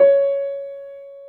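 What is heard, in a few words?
piano C#2, soft piano; AKAI S-Series CD-ROM Sound Library VOL-2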